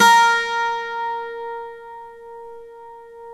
12 STRING; OMI - Universe of Sounds

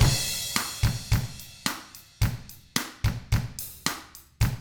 POP, Drums; ILIO; Double Platinum Drums 1

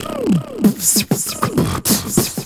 Beat Box, Vocal; Sonic Foundry (Sony Creative Software); Vocal Toolbox

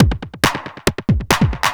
percussion loops 138; PS-45

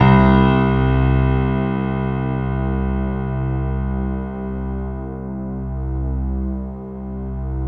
piano, Piano+String; Sound & Vision; Gigapack I CD 2

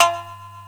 SHAMISEN; AKAI S6000 CD-ROM - Volume 1

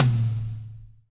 DRY TOM, TRASHY KIT; Spectrasonics; Burning Grooves CD2

